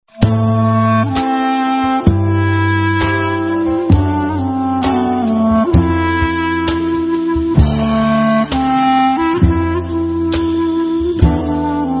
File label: Hanigun